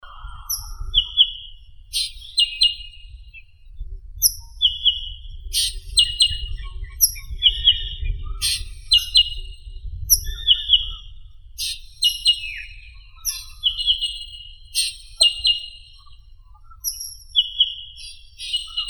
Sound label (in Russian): drongo chernii dicrurus macrocercus